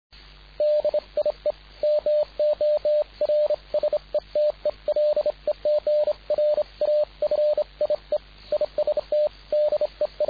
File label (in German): BUG Beispiel